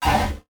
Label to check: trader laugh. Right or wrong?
Wrong